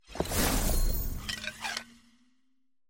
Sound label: crown sound